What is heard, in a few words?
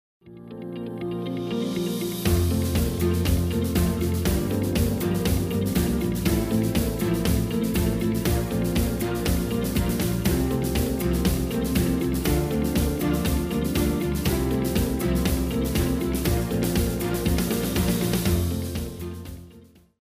Industrial